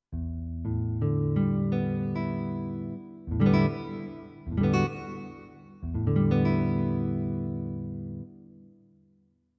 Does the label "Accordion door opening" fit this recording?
No